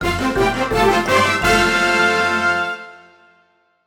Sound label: Goal Door; Kirby's Return to Dreamland Deluxe